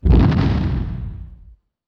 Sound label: destroy cruiser; vega